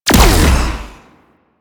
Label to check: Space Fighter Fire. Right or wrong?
Right